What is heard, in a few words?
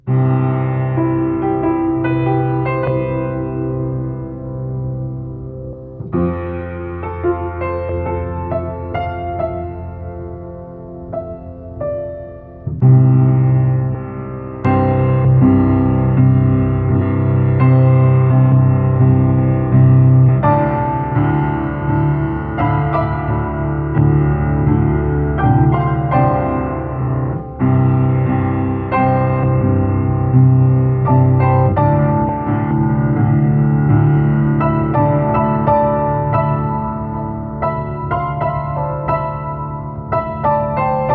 piano; improv: Piano